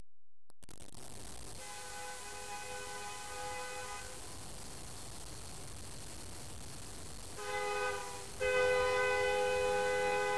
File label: train